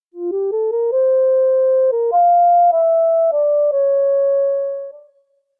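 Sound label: electronic play ocarina; anemoi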